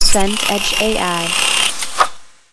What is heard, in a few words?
drill into masonry